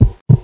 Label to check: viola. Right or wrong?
Wrong